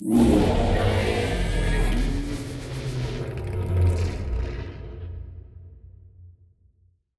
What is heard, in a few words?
void worms nymph death; Stellaris; grand archive; ships